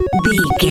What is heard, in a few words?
retro beeps success